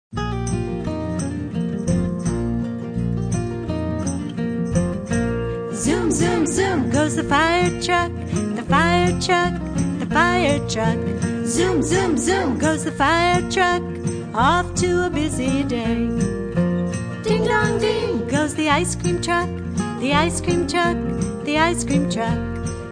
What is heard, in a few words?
zoom